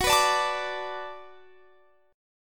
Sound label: D 6th Fsharp, chord